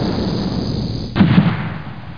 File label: land